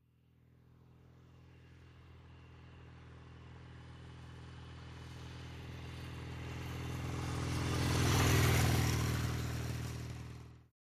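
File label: Motorcycle Norton Accelerate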